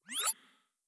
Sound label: Turn Up; blue archive